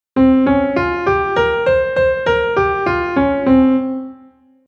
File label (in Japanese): 陰音階メロディ例